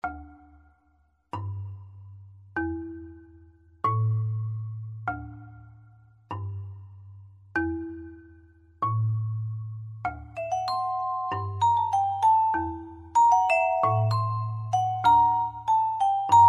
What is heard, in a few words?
Trio Passacaglia